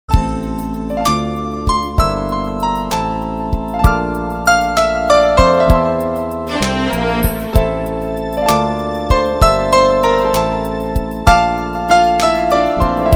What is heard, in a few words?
Ringtone Mobile